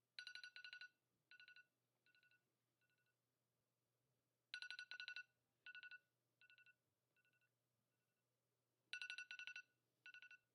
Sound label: iphone alarm